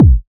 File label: kick